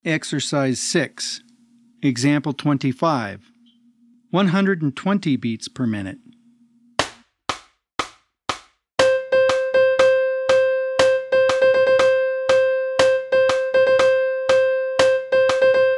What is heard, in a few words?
120 BPM